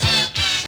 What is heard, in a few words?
two horned horns